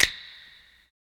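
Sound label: Snap, 100bpm, A minor; HappySloMo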